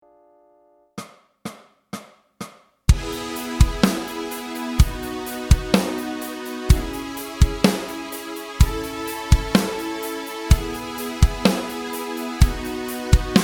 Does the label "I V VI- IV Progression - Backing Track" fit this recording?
Yes